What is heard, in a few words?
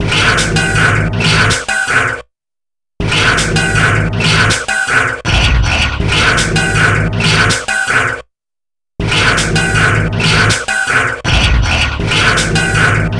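TRIPHOP, Right; INIS; Funky Xtreams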